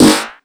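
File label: 127 drum 2 R, Fresh Disco House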